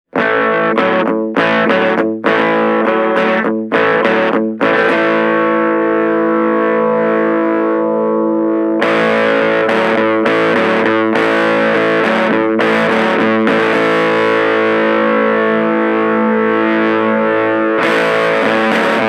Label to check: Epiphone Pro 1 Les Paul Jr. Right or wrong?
Right